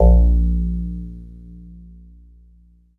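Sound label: Jazz Harp Kawaii A#4; keys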